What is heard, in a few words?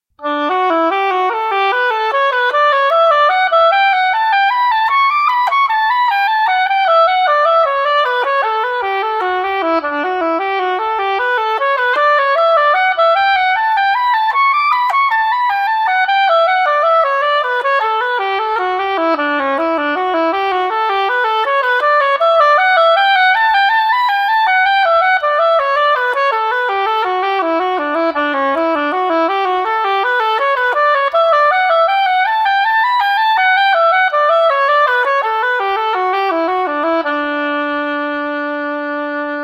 Oboe D Major 3rds